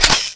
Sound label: sfpistol clipin; cstrike